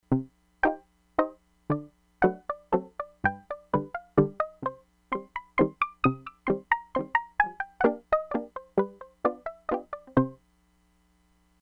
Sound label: PIZZICATO (PLUCKED) STRINGS, Crumar Bit 99; Factory Programs